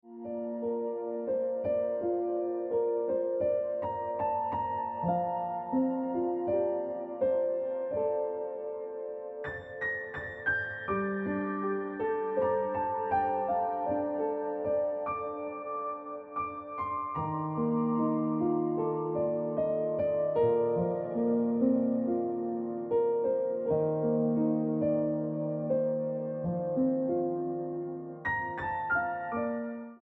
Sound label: Ringtone Piano Music